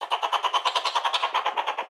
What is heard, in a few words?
laugh; CrossCode; battle; hillkat